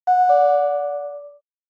Doorbell Fake; ドアベル フェイク

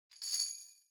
Tambourine Noise

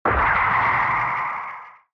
Retro Anime Accent Low Mid

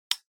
Outlet Timer Circuit Release; タイマー機器

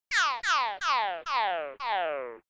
SNES Action Defeat

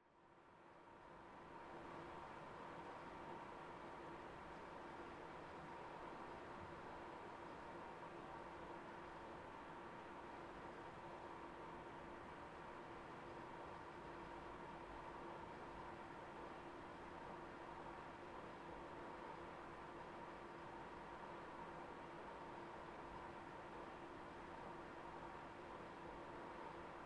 PC Operating Noise Far Long

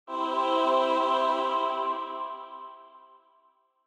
Angel High

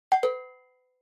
Cowbell Multi

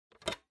Optical Disk Drive Eject

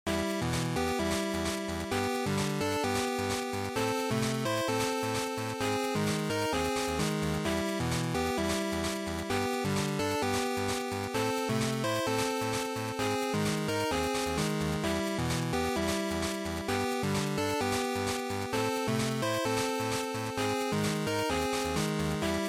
Fighting Continue, bgm

